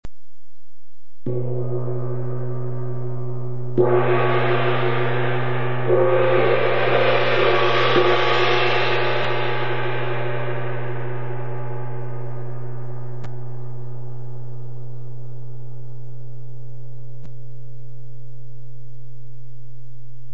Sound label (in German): tamtam